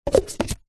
food drink coffee cup takeaway insert single cup into stack